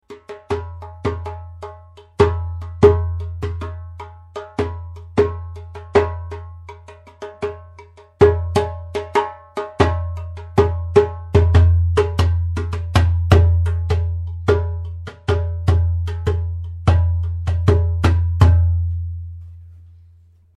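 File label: darbuka; durbuk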